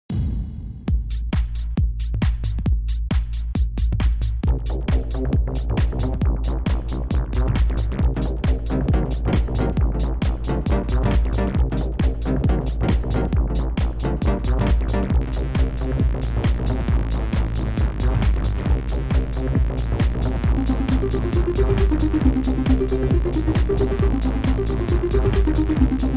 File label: Emulator X3